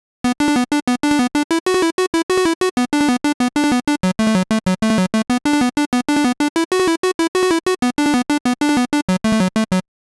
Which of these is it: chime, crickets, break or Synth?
Synth